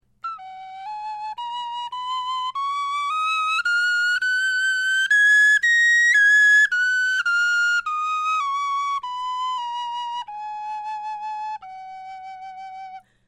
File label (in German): tonleiter